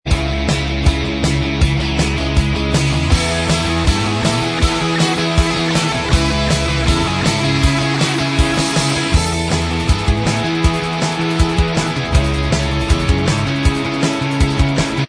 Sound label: Inst